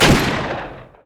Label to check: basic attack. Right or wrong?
Right